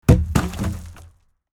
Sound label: wood big logs fall; matter mayhem; soundmorph